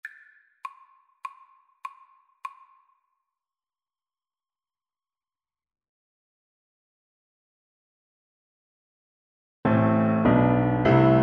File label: promenade, Tuba